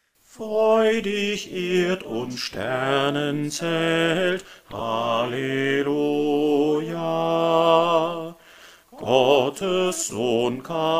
bass

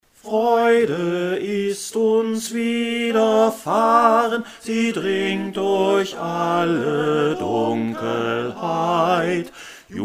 wjubel bass

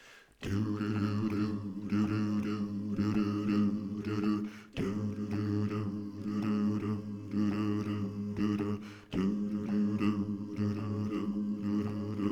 bass

